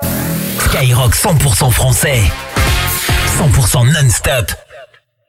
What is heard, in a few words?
JINGLE; SKYROCK 100%FR